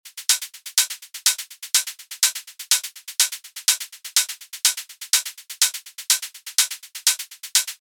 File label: Hat, gentle hats hi hats and shakers; basic wavez; gentle hats vol 2